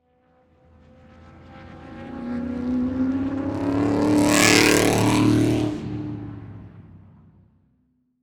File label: Race Car Drive By Straightaway 180D; transportation